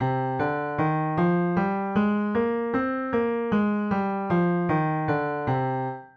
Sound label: c natural minor scale